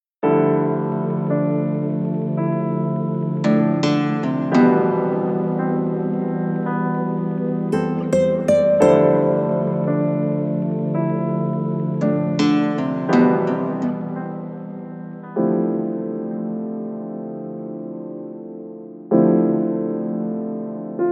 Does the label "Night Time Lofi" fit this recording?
Yes